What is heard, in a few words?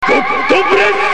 god breath